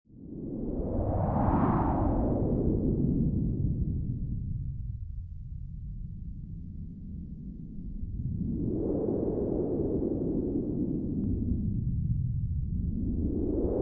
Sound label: Space Wind, Ambience with noises of wind, low frequencies; Desert planet ambience; Science Fiction Sound Effects